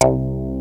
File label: SPLIT; Rubber Chicken Software Sample Bank